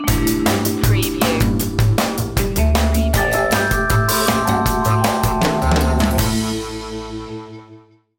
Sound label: jazzy opener